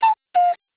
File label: Pigeon; Sanyo; SCP-5000